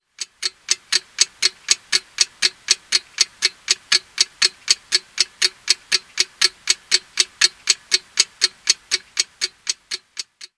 kello

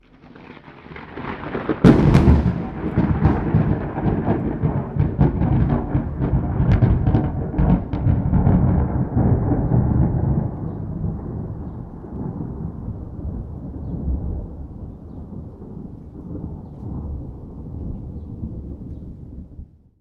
Ukkonen

